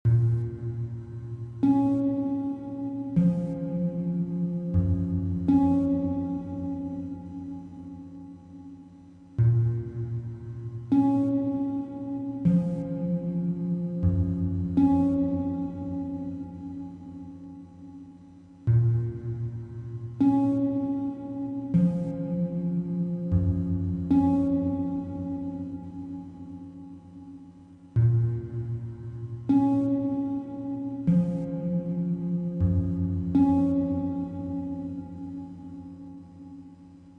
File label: BASS; BlockDrop